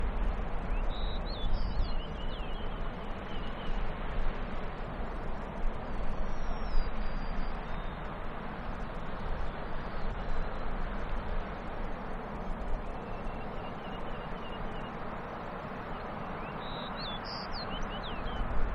Wandering Tattler With Golden-Crowned Sparrow and Fox Sparrow; Chorus; alaska acoustic highlights